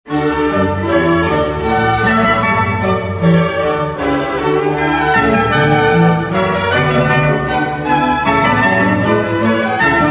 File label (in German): Coro, Kammerorchester; Kammerorchester Berlin